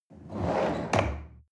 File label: chair wheel roll on floor hard squeak